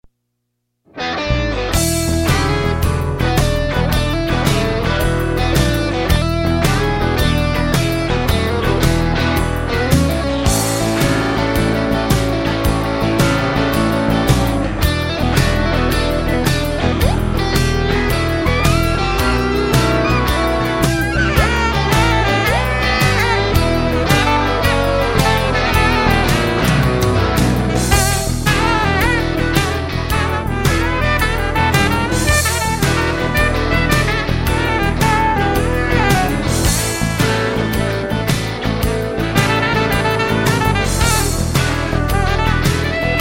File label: Blues